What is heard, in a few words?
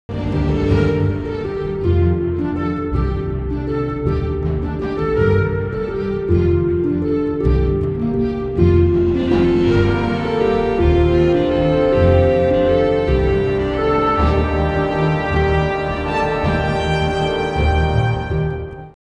tone; tes shadowkey